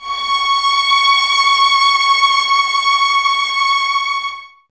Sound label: violas C#6, String Ensembles; Miroslav Vitous; VLS 1+2